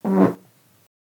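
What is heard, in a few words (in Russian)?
короткий маленький гудок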